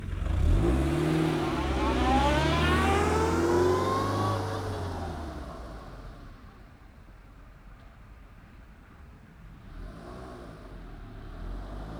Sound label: renault kangoo 1.6 16v exterior short reverse and slow drive away; stereo M10